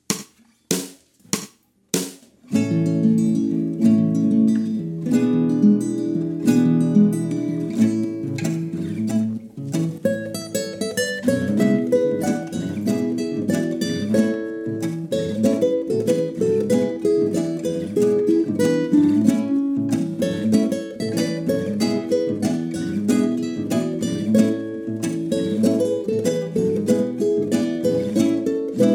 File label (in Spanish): Cuatro guitarras